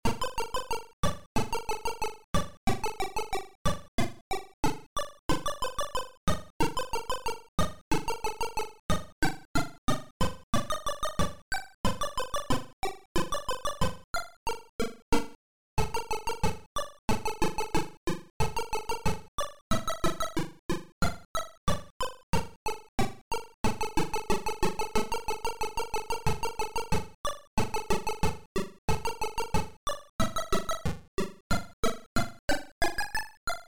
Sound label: Polka happy ritmation